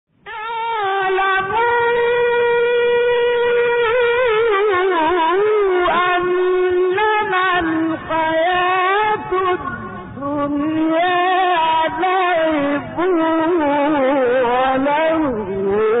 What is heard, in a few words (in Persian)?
تلاوت آیه سوره حدید; آیه 20; استاد محمد عبدالعزیز حصان